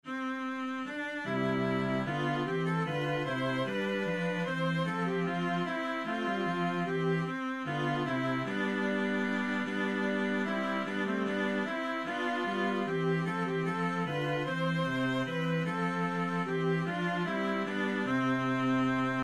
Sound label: minuet Full, viola